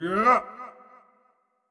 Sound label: Wind Up Short; Marv